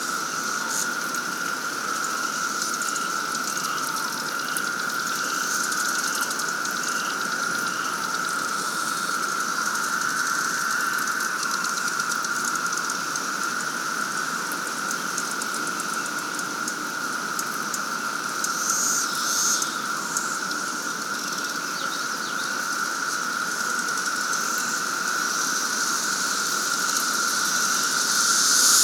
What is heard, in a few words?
13 Year Dead End Farm Cicadas; Cicada Bloom